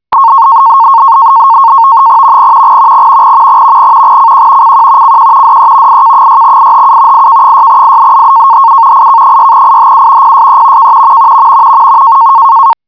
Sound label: AMTOR, Hobby Radio